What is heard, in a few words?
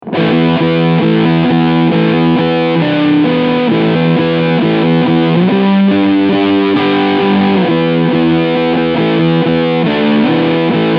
EarthQuaker Devices Hizumitas Sustain FULL Tone FULL Riff